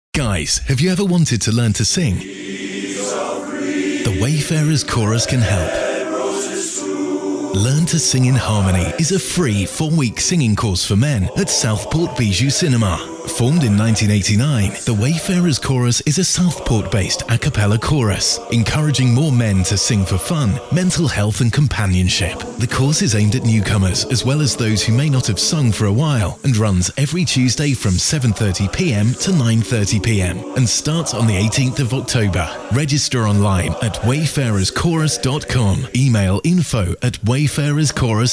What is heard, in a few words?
SINGING